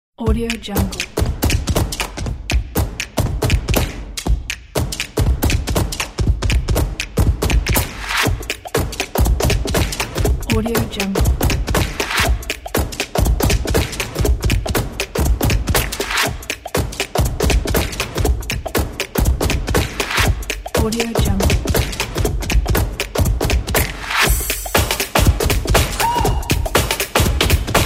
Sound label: happy rhythm drums; archimusic